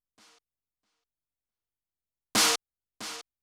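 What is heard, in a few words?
GATED snare drum, Techno 138 BPM; Best Service ProSamples vol.54; AKAI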